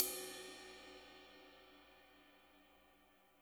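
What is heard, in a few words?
RIDE right, NATURAL KIT; Spectrasonics; Burning Grooves CD2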